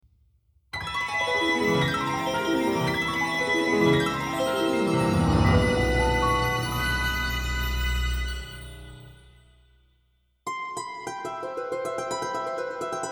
Surf Harp; the legend of zelda links awakening orchestral arrangement 2015; 4th Instrument of the Sirens

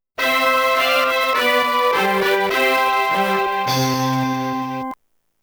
GAME OVER -Bright Version-

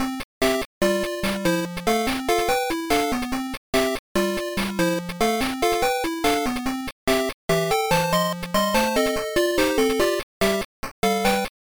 Puzzle BGM; Pepe's Puzzles; Pico

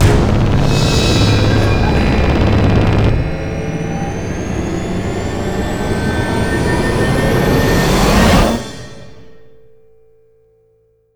Shield Upgrade; Prototype 2

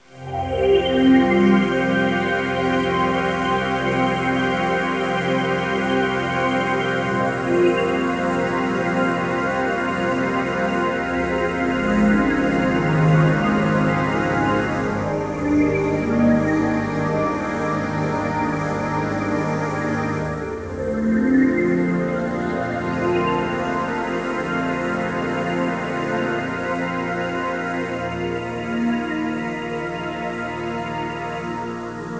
Pad; Great Eagle 2

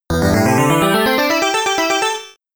Bonus Game Complete, amiga

